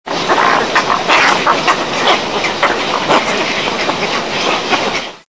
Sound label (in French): heron